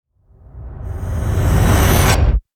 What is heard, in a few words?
Scoop Wipe; Ninja Tracks